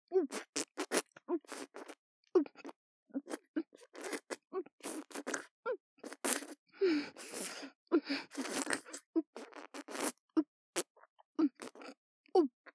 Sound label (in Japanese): 喘ぎ声 3P